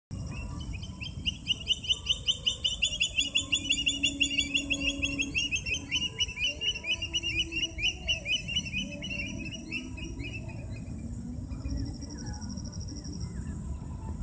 Indian Stone curlew Burhinus indicus

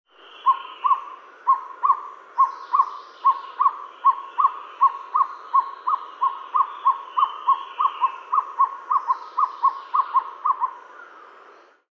Asian Barred Owlet Glaucidium cuculoides

